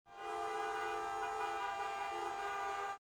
horns, nyc; Soldier Of Fortune II Double Helix; XBOX; ambience

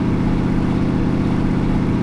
car; Soultree

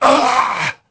dead, male; voices; Pirates Of The Caribbean

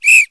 whistle short

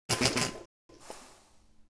shut, ships

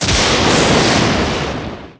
thunder

